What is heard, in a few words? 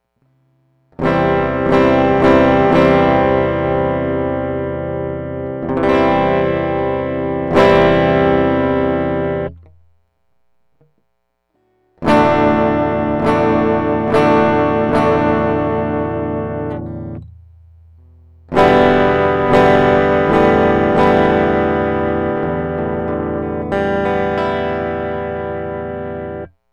chords, guitars